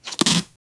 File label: katana cutting bamboo; sfx